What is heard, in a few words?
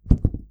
button